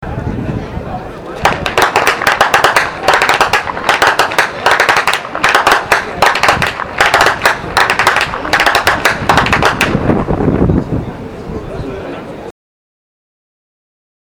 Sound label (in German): schnalzen